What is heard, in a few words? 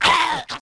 bskel hit; DAoC